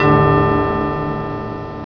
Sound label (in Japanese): tokei